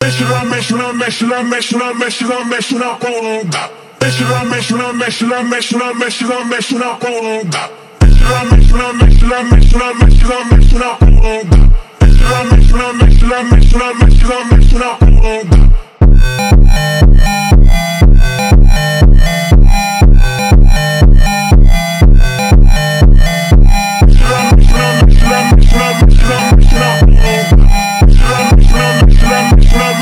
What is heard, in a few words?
Phonk